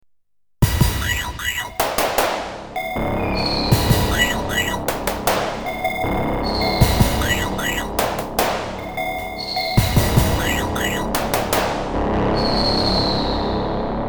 Nord Drum 3P SFX Sounds

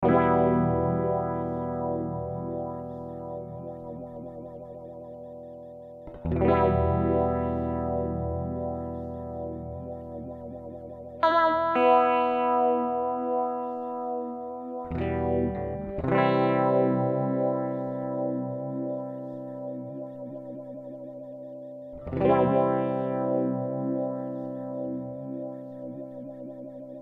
Fender Highway Phaser

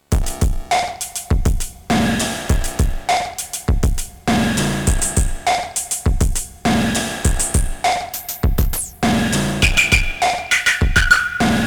HiHat Filter Tweaking; 1010music nanobox razzmatazz

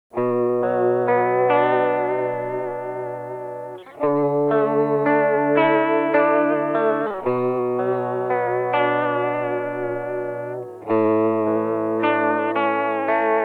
Noise Tape Worn VHS; Source Audio Nemesis

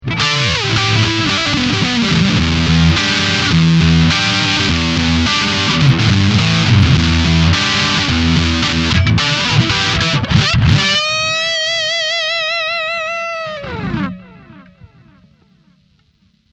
Heavy Fuzz

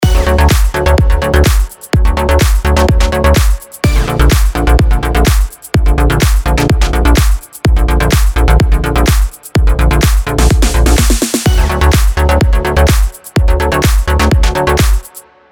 Future House Major Drop; Nexus 3; Future House 2

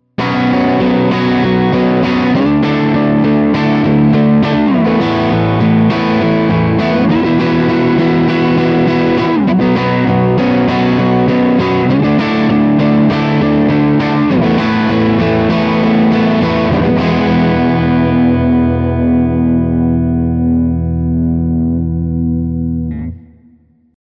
Framus Diablo Pro Neck Humbucker crunch

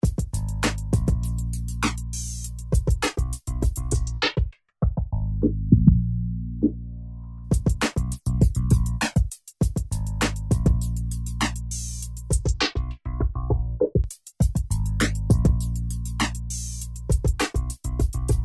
Loop Flanger Filter

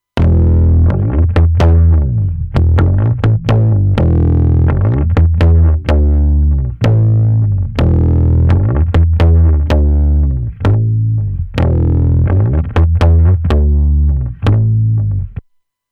Bass Black Lion Saturation

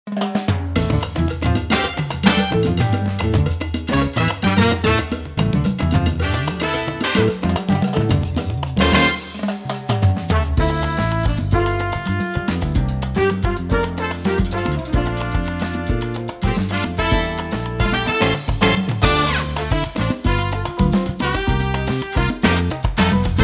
Yamaha Genos2 Track Latin

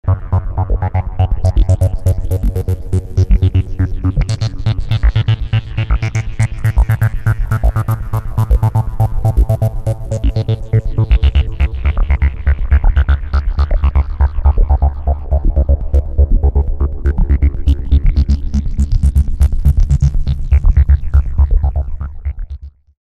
cs30 filter

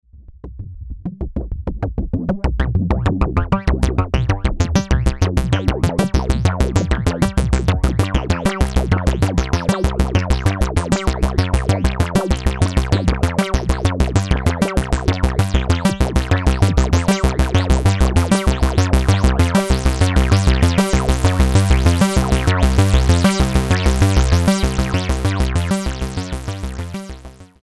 Double Sequence

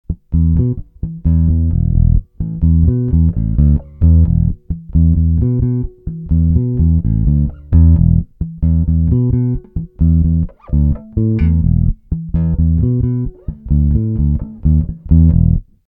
bass boost p pickup

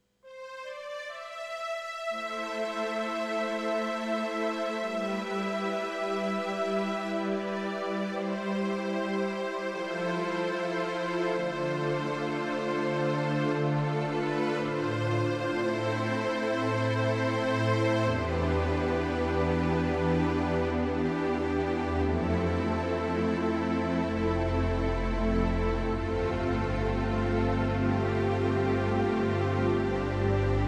Strings

